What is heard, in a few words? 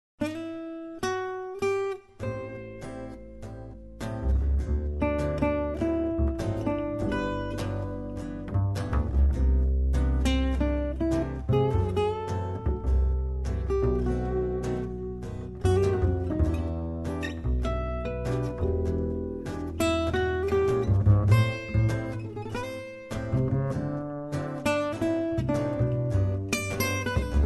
Swing Quartet